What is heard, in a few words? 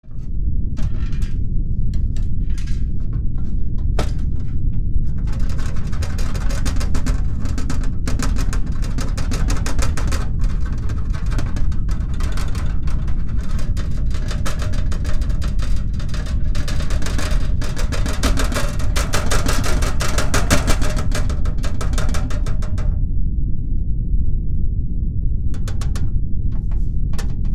Earthquake seismic activity low rumble metal rattling